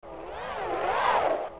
Poe Float; Zelda; OOT

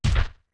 Step Land; Goron Link landing on the ground

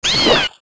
hit; Tektite

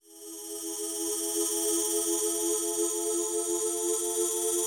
Great Fairy Gift Start; Zelda; OOT

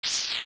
Bomb Defuse; Zelda; OOT

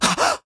Tornado Gasp; gasping at the black tornado; Link; Skyward Sword; zelda

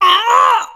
Bloat; zelda

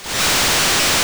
Water Flow; Oracle

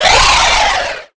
Boko Baba Die

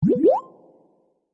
Stamina Fruit Regrow; A Stamina Fruit regrowing (Recorded); Skyward Sword; zelda

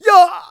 Spin Attack; Gramps; zelda

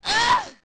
Gerudo Hit

